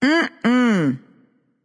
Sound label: nv disagree; smite; awilix